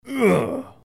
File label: armor, sfx